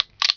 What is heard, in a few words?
Henchman Gun Cocking; Playable Tutorial Level; TR2